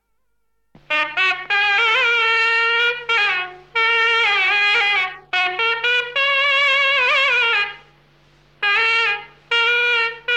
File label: Nadaswaram, Instrumental; Thillaanaa Mohanambaal; Tamil